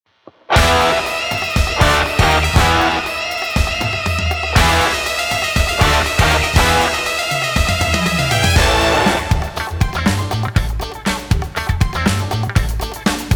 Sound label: Queen Bee Rock